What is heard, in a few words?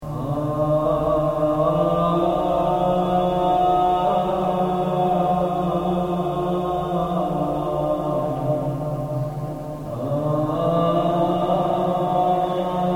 gloria enharmonik, organum